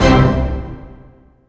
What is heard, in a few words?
shock, electronic